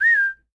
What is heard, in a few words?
alarm; LINE